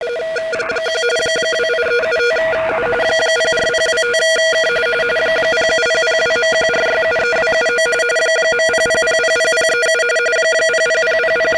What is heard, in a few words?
rtty, 3596MHz